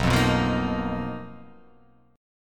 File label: B Major 7th Flat 5th B down, chord shape